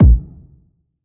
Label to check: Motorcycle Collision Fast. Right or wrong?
Wrong